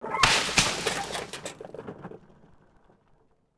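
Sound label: Artillery Run End Forest; Civ4 warlords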